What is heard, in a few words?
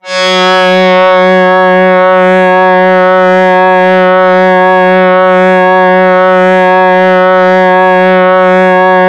DoubleBass f#3, Yamaha YX81Z